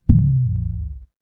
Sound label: feedback fail